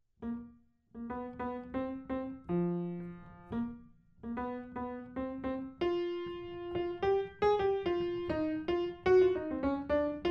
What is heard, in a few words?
Rhythm Changes Solo, piano